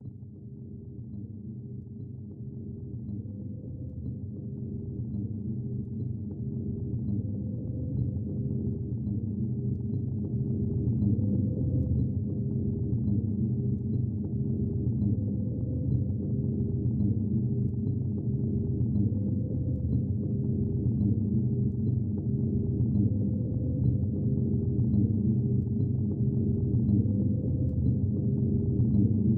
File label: Drone Noise